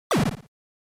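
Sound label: Game Boy General Pitch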